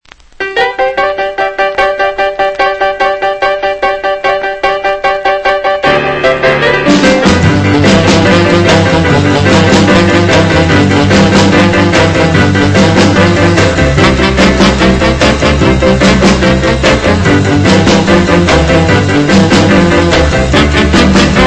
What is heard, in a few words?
piano rock